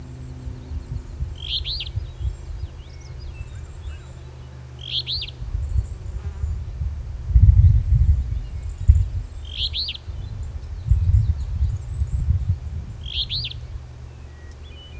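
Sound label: alder flycatcher, fee bee o; 15sec; Traill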